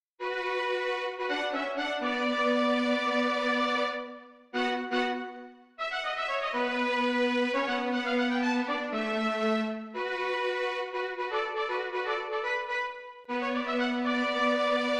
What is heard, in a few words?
Strings; Sampletank